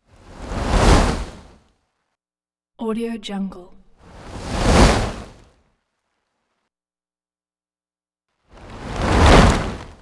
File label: whoosh by fiery